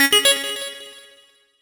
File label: Calendar alert; Sony Ericsson; PlusFourSix; Josephine (G700)